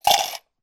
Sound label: coins; Money